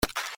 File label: A note